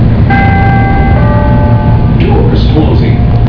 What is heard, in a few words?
Doors Closing